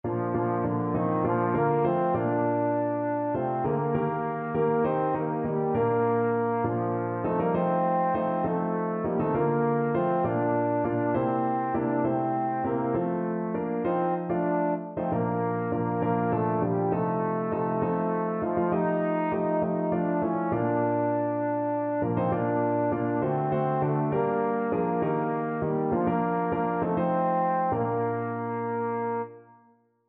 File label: trombone